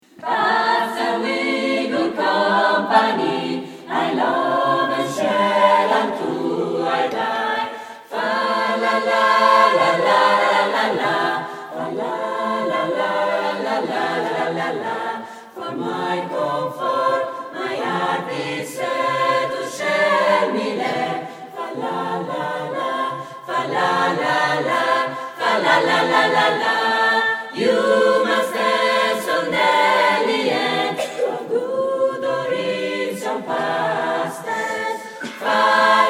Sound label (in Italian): canto polifonico; canti liturgici